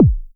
KCK, Roland SH-09 synth drums

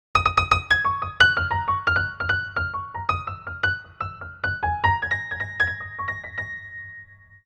Piano Solo, Cinematic Funk; Cinematic Funk Urban Tone Poems

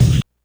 kick; Big Fish Audio; Hardcore Breakbeat Action; Funk Factory

